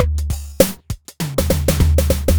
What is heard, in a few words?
shufflin beat fill; Sonic Foundry (Sony Creative Software); Ugly Remnants VOL-2